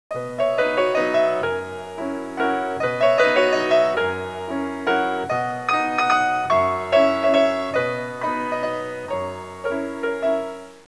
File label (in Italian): suonerie classica; musica classical